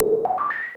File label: MOOG HAT; AMG - Vince Clarke Lucky Bastard